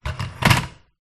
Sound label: bathroom scales down hard tiled floor